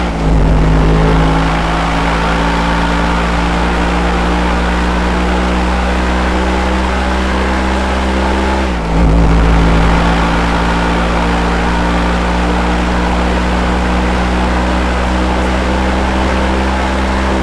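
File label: fourth cruise, car engine; tdmcars; gtav; adder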